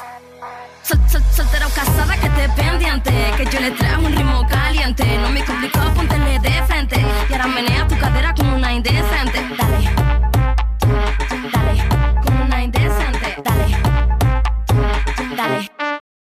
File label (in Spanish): RITMO CALIENTE; ZOLKI INC